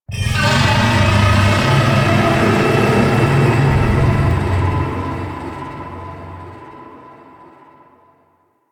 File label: Orb Intro Pre Vocal; Warframe; Ambience; Venus Open World; Objective Rooms; Venus Obj Deck Twelve